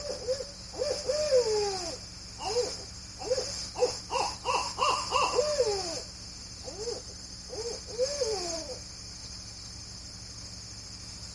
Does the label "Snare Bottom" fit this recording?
No